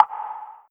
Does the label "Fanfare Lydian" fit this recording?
No